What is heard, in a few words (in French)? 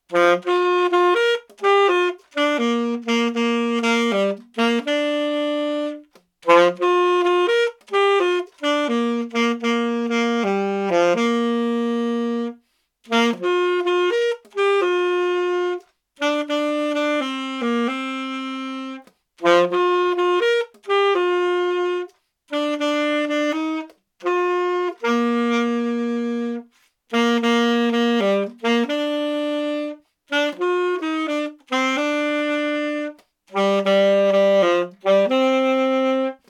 discussion tardive saxo